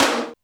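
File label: Hi Agrsv, Alesis D4 drum machine tom; 300 Drum Machines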